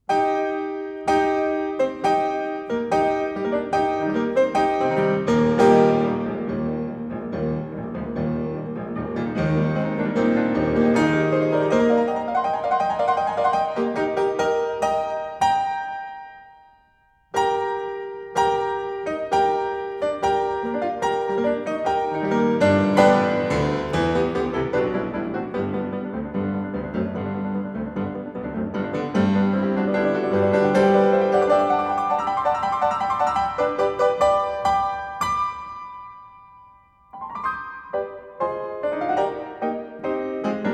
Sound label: Etudes-Tableaux, for piano, In E Flat Major; Rachmaninov